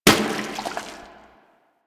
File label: Water Balloon Impact; Warframe; Water Balloons